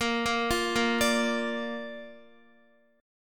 A sharp Major, Guitar; arpeggiated; JGuitar

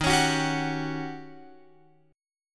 Eb 7th Sharp 9th, Eb, Guitar, Open F; strummed; x,10,13,13,10,13

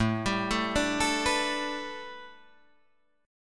A 7th Suspended 2nd Suspended 4th Guitar Drop D; A7sus2sus4 arpeggiated

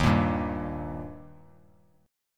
D sharp Major D sharp Bass Standard, chord; strummed; x,6,8,0; JGuitar

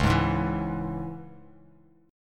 Db Minor Bass Standard; strummed; 9,11,6,9

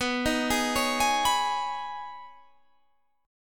Db 7th Suspended 2nd

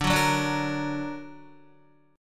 D Augmented D down Guitar; D+ strummed; JGuitar

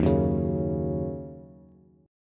Eb Minor 7th Eb Bass; strummed

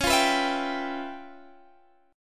Db 7th Sharp 9th Flat 5th Guitar Half Step Down

